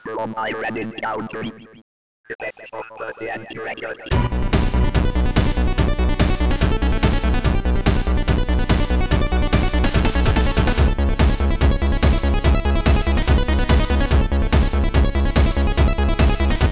keygen song

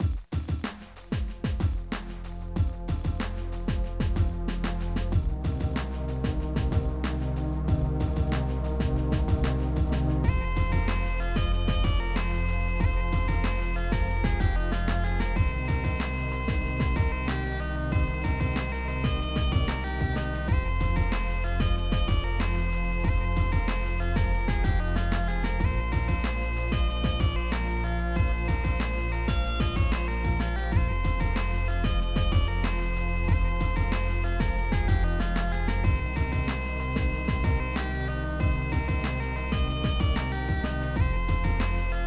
keygen song

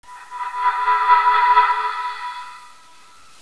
scary

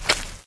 player slosh

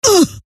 pain, scientist female

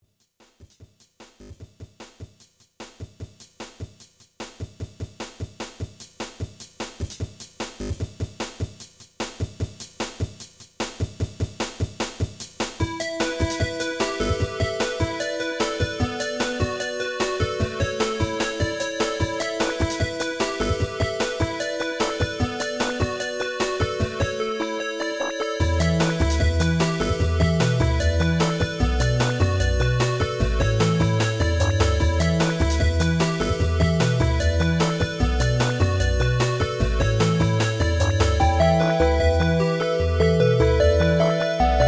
keygen song

